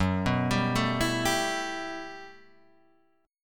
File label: G Major 13th